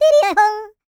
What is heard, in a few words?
YODEL; ILIO; Vocal Planet